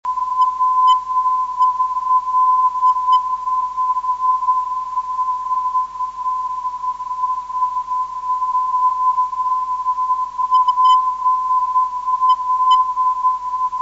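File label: 351 kHz, non-directional beacon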